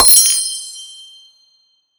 UI Menu Gems Select; Skylanders Cloud Patrol; Arcade, iOS, Mobile

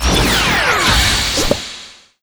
Enemy Factory Vacuum; Disney Star Smash; Android, iOS, Mobile

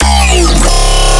Bass Growl 200 C#

